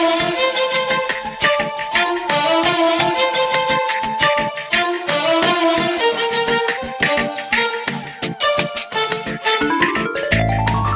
new malayalam ringtone

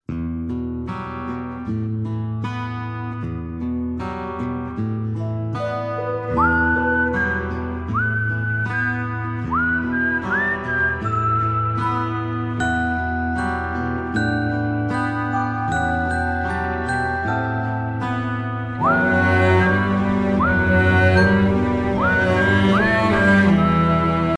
guitar whistle ringtone; aatma

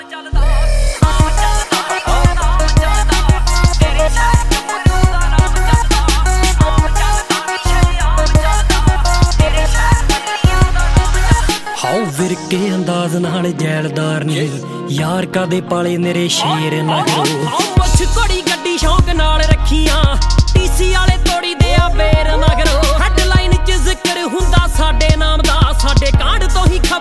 new punjabi ringtone

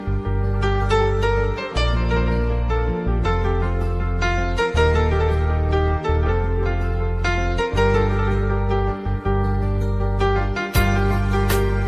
love music ringtone, bollywood ringtones

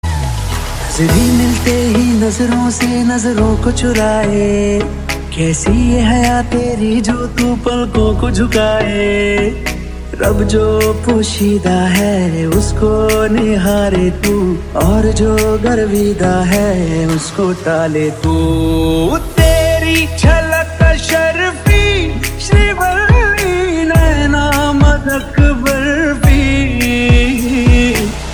hindi love song tone ringtone; bollywood

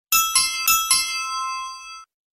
dong ringtone, sms

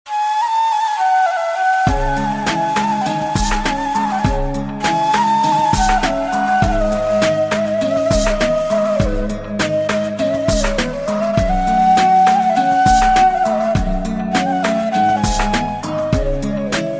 sad arabic music instrumental ringtone

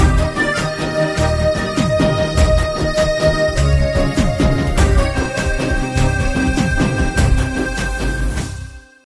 new hindi music ringtone, bollywood ringtones

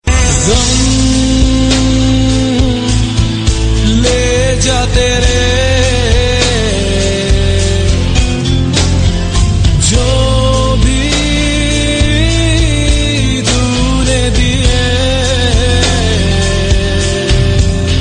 sad tone ringtone, bollywood

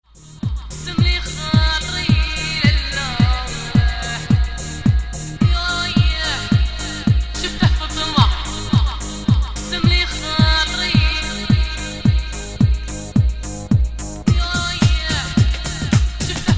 arabic arabisch mix ringtone; nokia ringtones

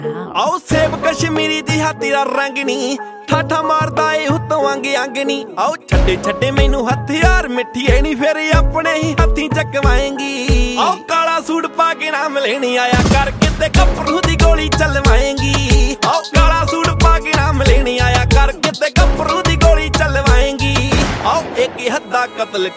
punjabi ringtone

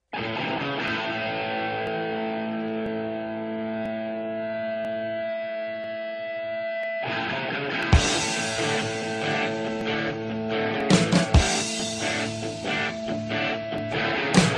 instrumental

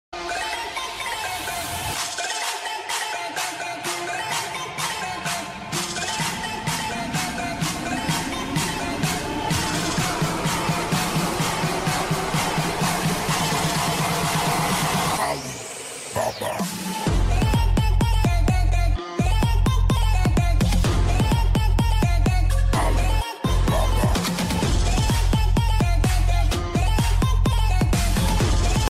trap ringtone

